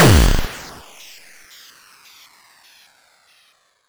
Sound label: hard, explosions